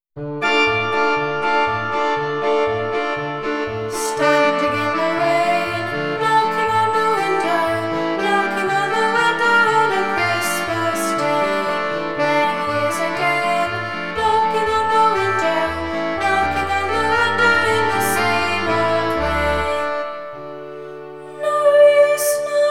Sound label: Vocal; mayors christmas carol music